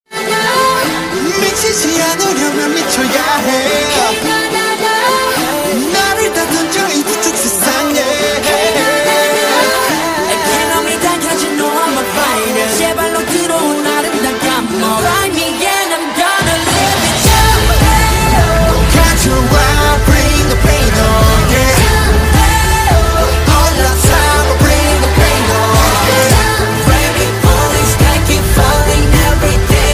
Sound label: korean ringtone